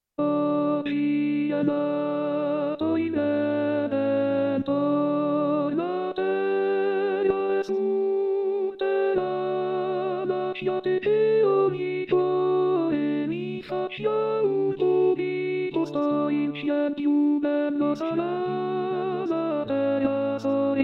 Contralti; Joy To The World; Handel; natale

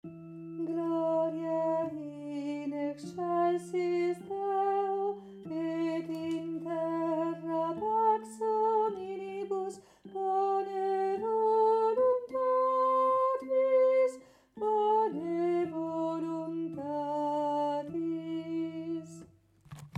gloria rit tenori; anno liturgico

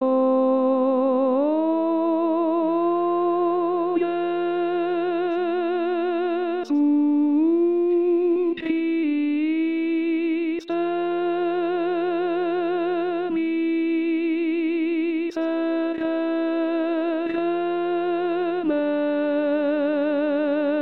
Tenori; O Jesu Christe; pasqua

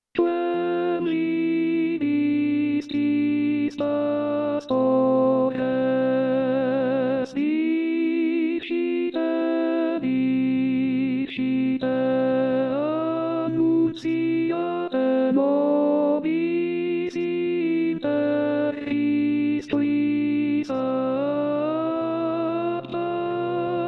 Contralti; Quem Vidistis Pastores; natale

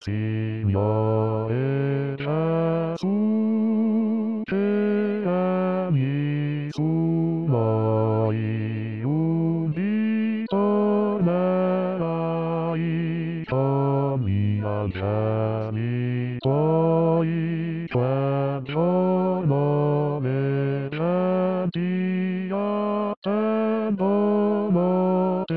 Signore Gesu Bassi

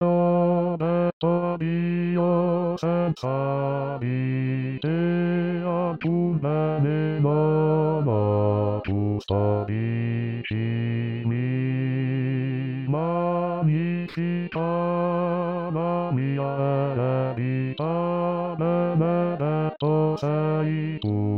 Bassi

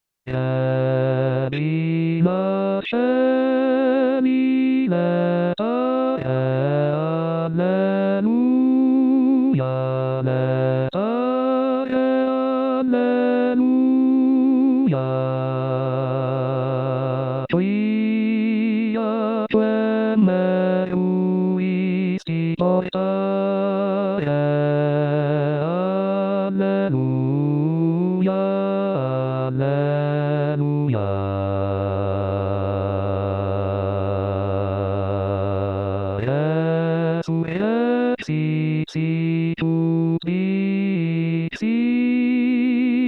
bassi; Regina Coeli; Lotti; pasqua

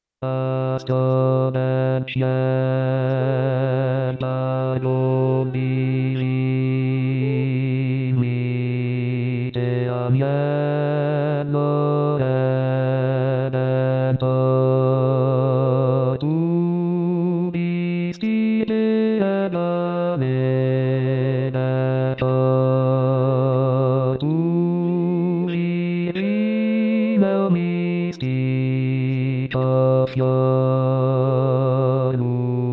Bassi; Astro Del Ciel; Gruber; natale

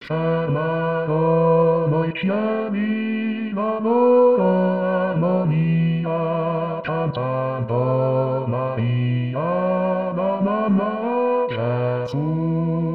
Bassi; Fermarono i Cieli; S Alfonso; natale

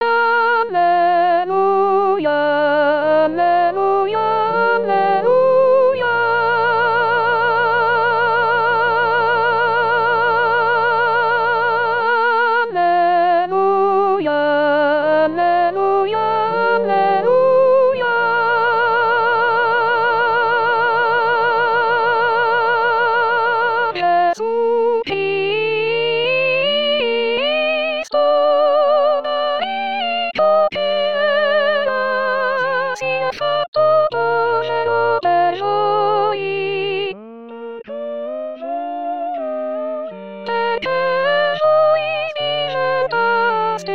Canto Al Vangelo Soprani

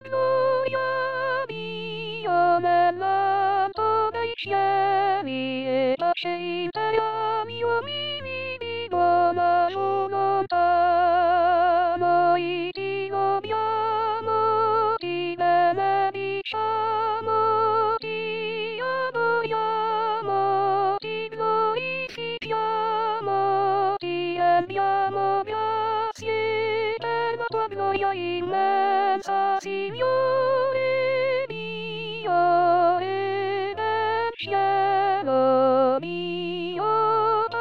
Soprani; Gloria a Dio

